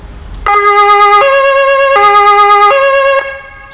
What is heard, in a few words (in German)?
sirene, polizei; bos